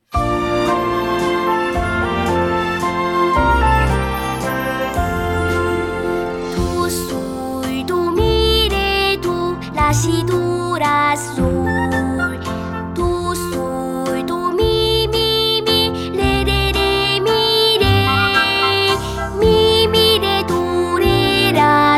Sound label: scale